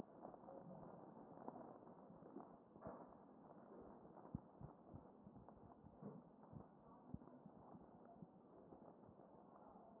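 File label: anterior chest, position 4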